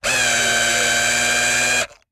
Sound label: Electric Drill Tight; Sound Effects Bible